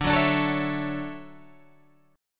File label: D-Major 9th D down Guitar Open G